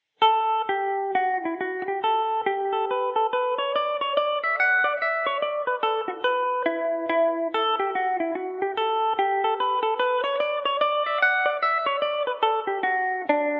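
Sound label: heather glen D major revelation, mandolin; Revelation Jaguar electric mandolin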